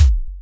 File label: penalty kick